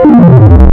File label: Dist Square Swp, wavetables; Animoog